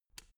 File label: switch turn on soft